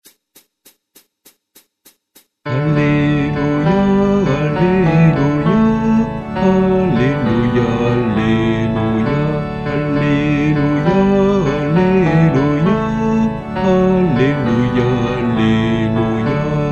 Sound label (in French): Alleluia Guide Chant Basse